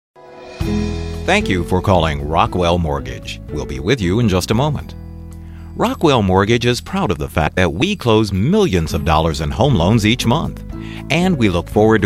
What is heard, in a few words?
Phone Hold; Demo